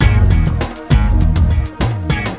FUNKEN; Macromedia - Multimedia Showcase 5.1

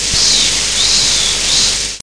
Strom

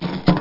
klapp; mruwurm; Creative Voice

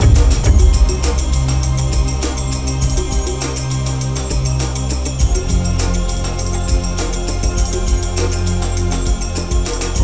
Musike; antares

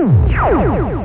swutsch; Dosgames 2.0

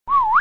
pfiff; Yetisports2; Esportes

